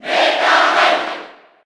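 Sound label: Cheer Japanese; Meta Knight; SSB4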